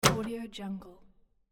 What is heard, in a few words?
item crate unlocked